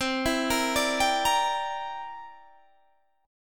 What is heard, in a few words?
C 9th C down Guitar Open D